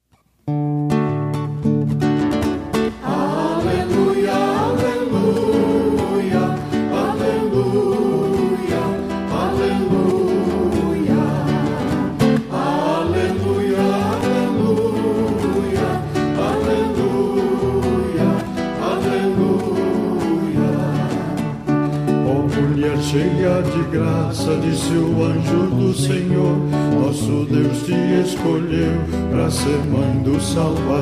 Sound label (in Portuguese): aleluia